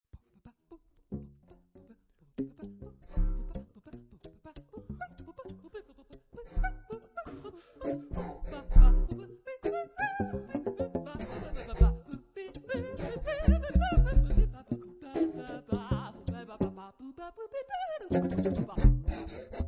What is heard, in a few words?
VOC FLUTE; Live Aufnahmen